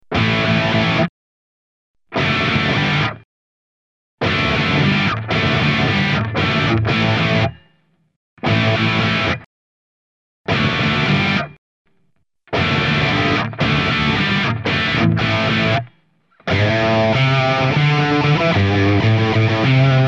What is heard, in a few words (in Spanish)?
Emulacion de Marshall JCM800